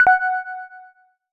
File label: sound shop finish; WiiMart